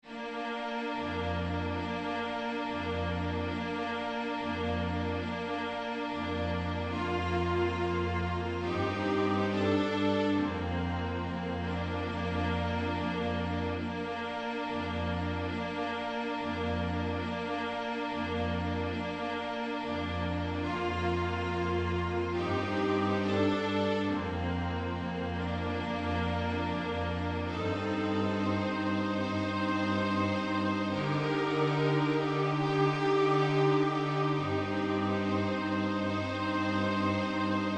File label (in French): Marche funèbre